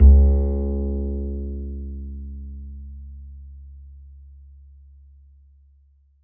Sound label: E, E string upright bass; Larry Seyer